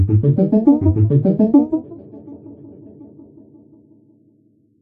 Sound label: Trip Arp; And Yet It Moves demo